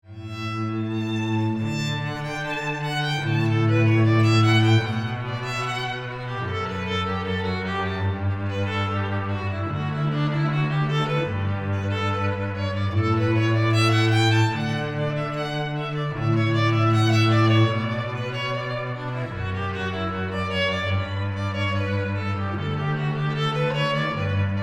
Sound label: violin cello